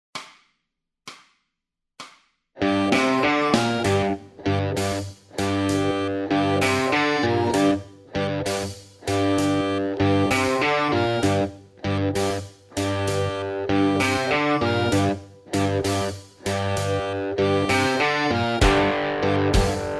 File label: bass blues, backing tracks